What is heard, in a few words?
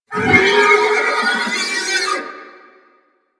Horse Vocal Screech; Warframe; Vehicles; Duviri